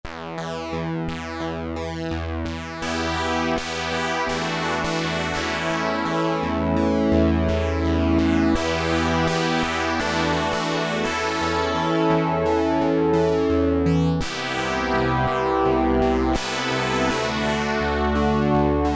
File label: PULSE SWEEP, Oberheim OB-8; Factory Patches